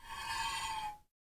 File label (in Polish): przekrecanie rury